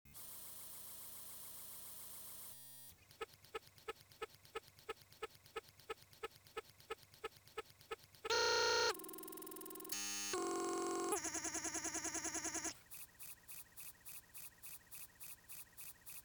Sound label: Glitch